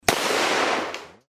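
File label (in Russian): кольт 45 на открытом воздухе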